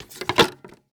Technology Electronic Machine Wood Record Player Placing Record Quickly